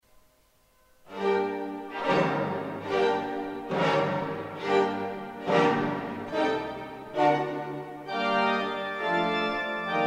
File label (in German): Orgelkonzert; Rheinberger